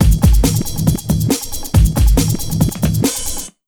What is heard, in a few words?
Big Beat Drumloops, 138 BT